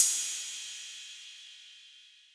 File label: Ride, Drums; BB 2HTC Samples Vol 4